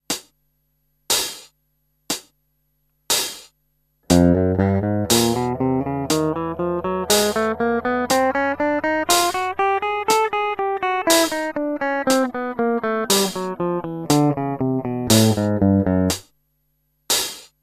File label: finger easy